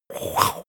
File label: monster gasp; kreaturen